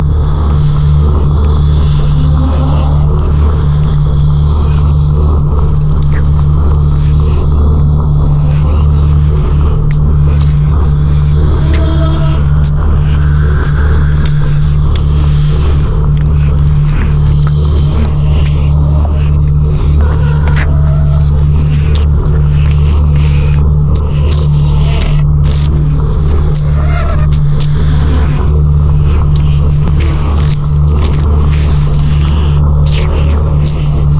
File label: Ominous Drone; A Robot Named Fight